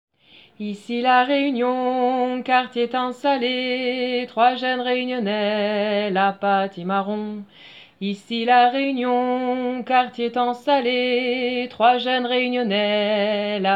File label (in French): voix basse